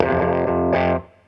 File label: Riff; USB Soundscan vol.31; Miro s Grooves; AKAI